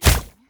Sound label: vibe car